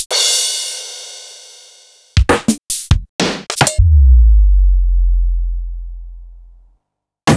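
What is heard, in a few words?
Roland MV8800 drum REGAETON KIT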